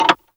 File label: CLOCKERS; Spectrasonic Distorted Reality 1